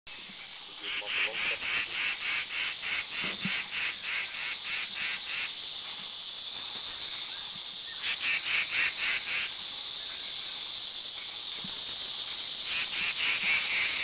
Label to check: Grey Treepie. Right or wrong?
Right